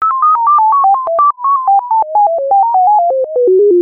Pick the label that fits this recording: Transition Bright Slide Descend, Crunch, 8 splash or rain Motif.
rain Motif